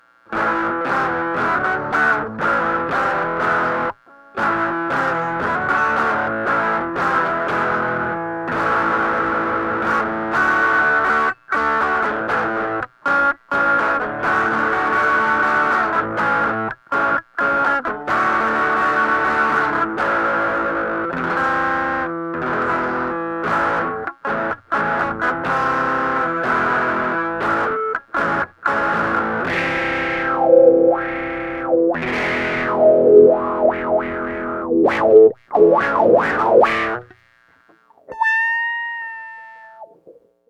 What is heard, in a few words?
electric